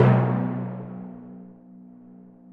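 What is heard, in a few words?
Timpani Hit Low; Digital Sound Factory Virtuoso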